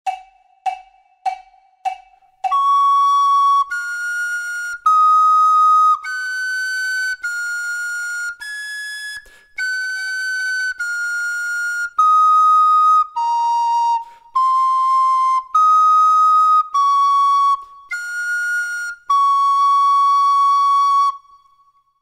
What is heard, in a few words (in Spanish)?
DUETO FLAUTA